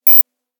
unexpectedly message tone; application user interface ui sounds

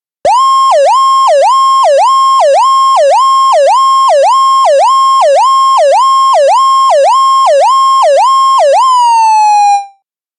siren firetruck; ringtone

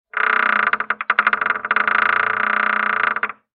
creaky door; everyday objects

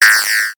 do not press this button; wake-up tones

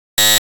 nasty error long

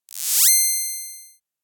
razor sharp, ringtones; standard ringtones

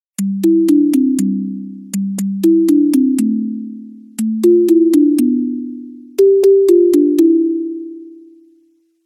jingle jingle bells; christmas ringtones

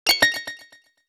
lots of data; message tones

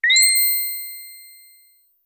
pitched so high; message tones

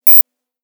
unexpectedly message tone; application user interface ui sounds

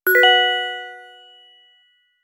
oh finally, message tones

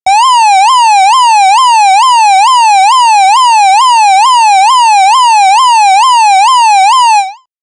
siren police patrol; ringtone

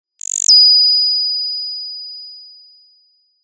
long tail ringing sound; standard ringtones

